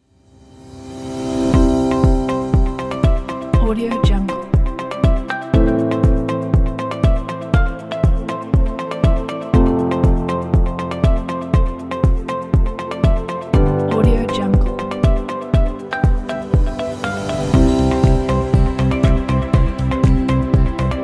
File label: happy